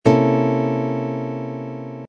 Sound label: c dominant thirteenth flat ninth chord voicing, guitar